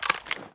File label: sniper deploy; czero opforce